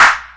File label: clap